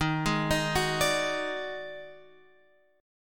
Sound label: Eb-Minor Major 7th Double Flat 5th Guitar Open F, EbmM7bb5 chord; JGuitar